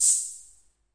vox hh, Vox AC1 RhythmVOX; drum machines

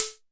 Arturia MiniBrute prc

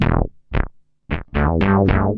dirty offbeat funk synthbass; BASS LOOPS

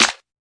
clap; Claps

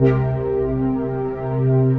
Synthesizer Middle C Notes, Lead

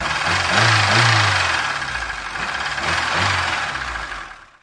Electro FX; Ueb HE Electro FX

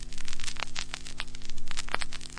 record crackle and hum; Scratching and Turntablism

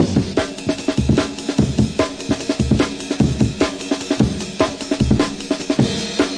150 full length amen; 141 to 160 bpm

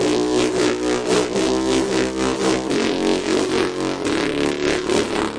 mean bass; remixable collections

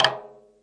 miyadaiko D1; ETHNIC and WORLD PERCUSSION

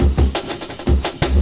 170 drop the ball, drum loop; 161 to 180 bpm

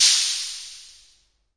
vox cym, Vox AC1 RhythmVOX; drum machines

